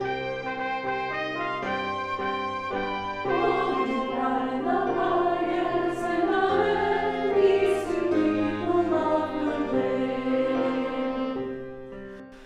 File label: Gloria Melody; music ministry